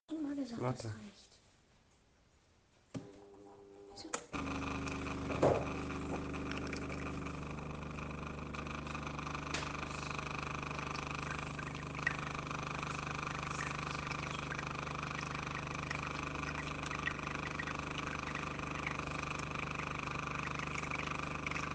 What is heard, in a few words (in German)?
Kaffeautomat